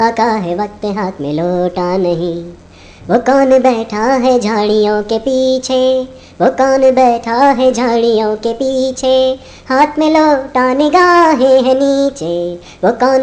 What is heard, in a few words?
funny ringtone, Hindi Funny Ringtones